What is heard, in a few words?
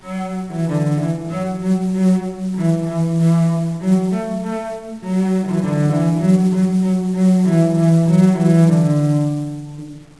cello